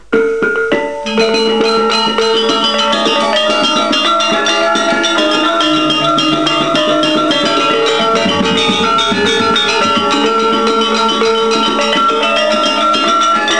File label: Gamelan of Bali